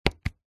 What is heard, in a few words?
technology mixing desk digital button press